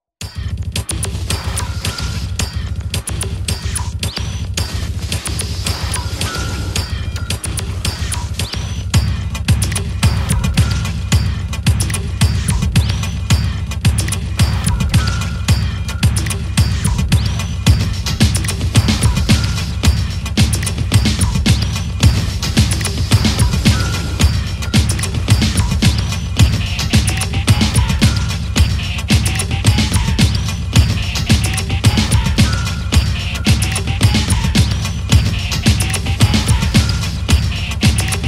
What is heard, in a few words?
MACHINE DRUM